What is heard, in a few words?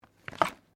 Book Page Gloss Flip; Book movement; Books (Sound Effects:Offices)